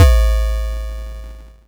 Crushed Perc, bitcrushed; musicradar

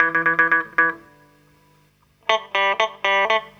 CLAV GTR; USB Soundscan vol.32; Roots Disco; AKAI

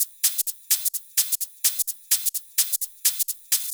Loops 128BPM, Drumloops

